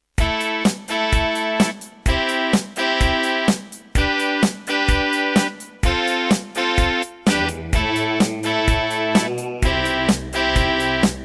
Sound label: Yamaha DB51XG GSMODE; raptor call of the shadows